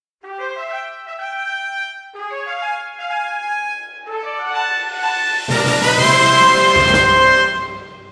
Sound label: Trumpet March; Asus; Zenfone-10